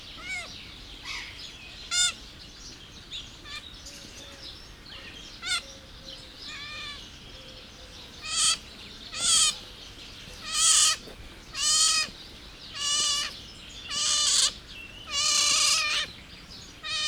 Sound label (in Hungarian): azsiai kiskarmú vidra; jászberényi zoo